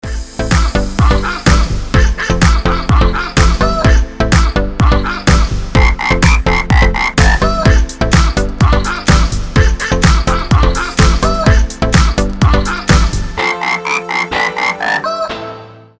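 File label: dog barking and rooster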